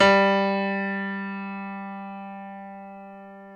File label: PNO, Grand Pno; Club-50 - Foundations Roland